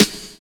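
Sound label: NICE snare; Univers Sons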